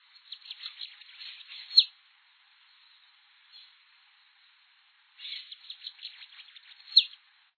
cambacica

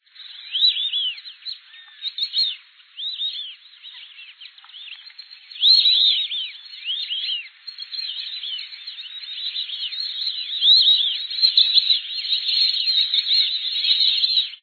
irere

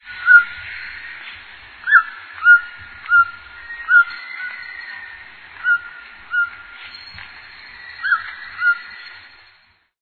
tucano grande de papo branco

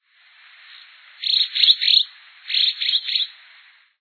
andorinha-serrador

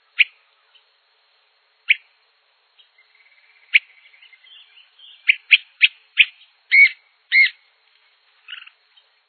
maria-cavaleira-de-rabo-enferrujado

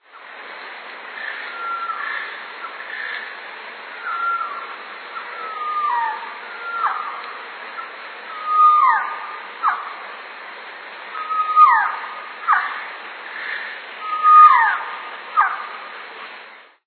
surucua-acu

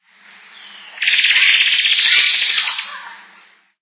arapacu-riscado

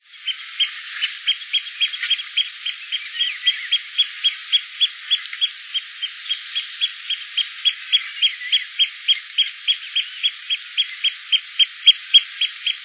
gaviaozinho

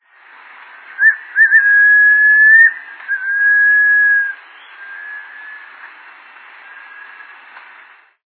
rapazinho-estriado

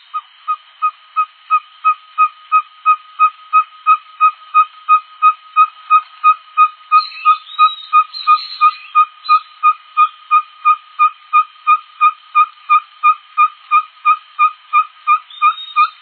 cabure

